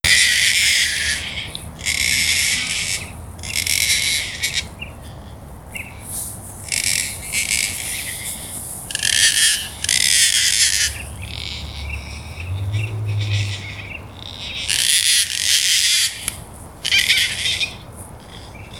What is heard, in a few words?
Gang gang Cockatoo Callocephalon fimbriatum